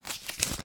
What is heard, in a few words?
household small coupon voucher book page turn